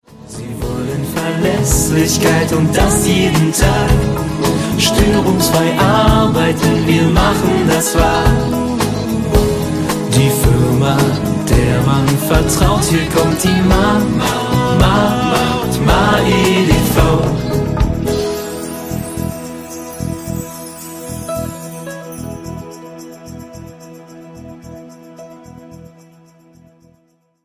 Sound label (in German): Song Iphone Klingelton; Mahr-EDV